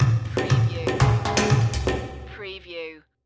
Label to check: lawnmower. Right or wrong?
Wrong